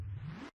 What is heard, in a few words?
unslow mo; vectozavr-shooter